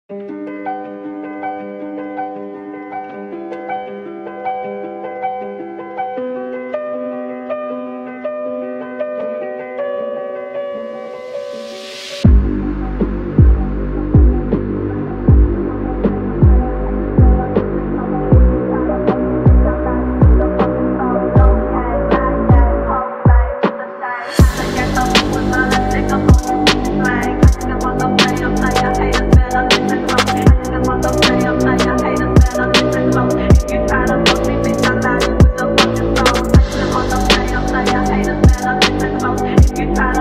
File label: funk phonk remix